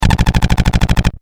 Science Fiction Pulse Rifle Machine Gun Long Burst; Battle; SFX Bible